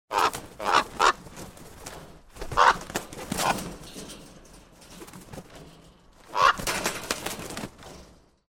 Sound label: bird crow in the cage